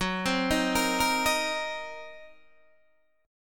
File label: Gb 6th Flat 5th Gb down Guitar DADGAD